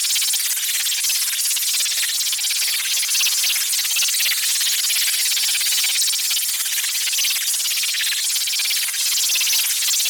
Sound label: killer sound wave